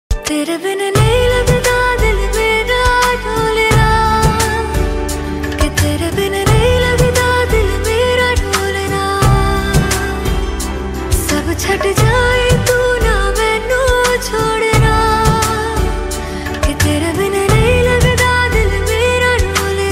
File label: Ringtone Female